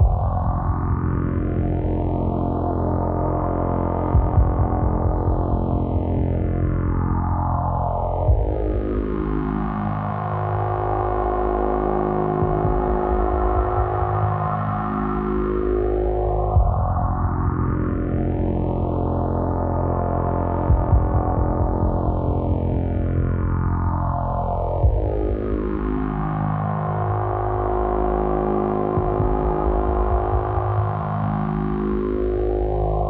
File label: drained loop irie synth 116bpm; Aeon Must Die